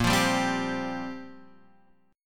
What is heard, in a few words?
C 7th Suspended 4th, guitar